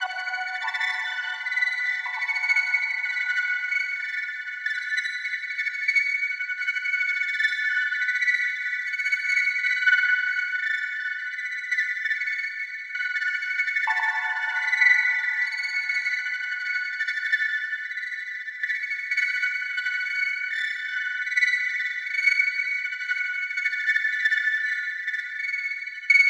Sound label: granulized glitch